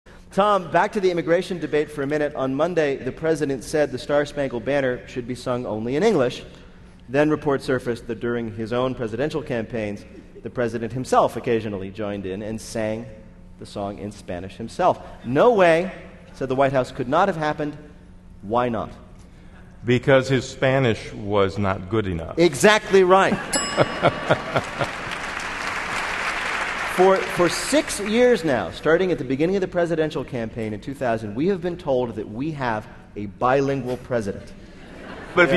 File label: Morse Anthem; Wait Wait